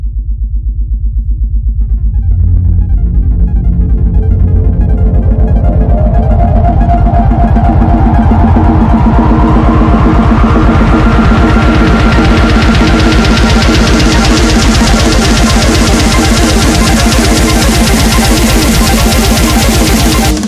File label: Drop, wub wub wub; TF2Maps